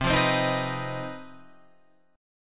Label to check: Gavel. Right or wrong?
Wrong